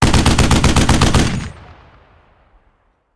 Machine Gun; Sound Ideas; Guns

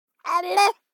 BABIES TALK Boy 12 months Abluhh; Baby Boom

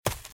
Footsteps Leaves Sprint Left; RadwinIsland

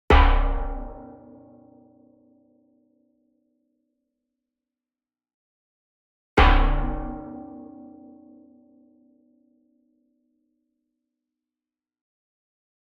Sweetener Metal Pan Hit Hard Beater Near; boom library; Cinematic Strikes Construction Kit

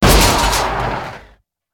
shoulder dirt hit; Blind Drive

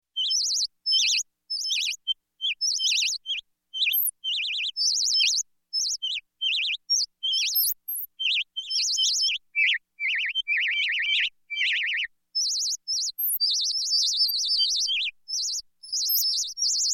Space Space Chirps, Electronic; Sound Ideas 2000 Series

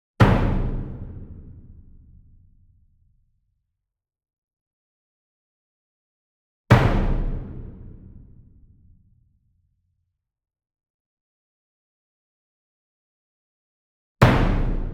SINGLE DRUM Verdi 66 Inch Hit Soft Beater Medium; boom library; Cinematic Strikes Construction Kit

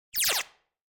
Beeps Sci-Fi Space Machine Beep; Hollywood Edge; sound designer's toolkit v2

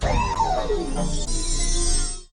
lift fail; Apotheorasis

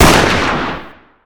Gun Pistol Shot; Weapons

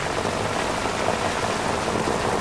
Auto Tires Dirt Loop; Automobiles

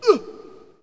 Hockey Male Hurt; SFX Kit; Hockey Vocal Sounds

